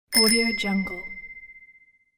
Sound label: single bike bell ring